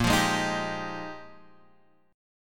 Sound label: Bb 13th Suspended 4th; Bb13sus strummed